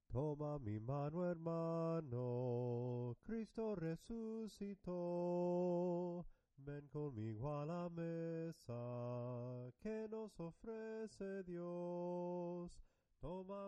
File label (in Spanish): SOPRANO CANTADO; TOMA MI MANO HERMANO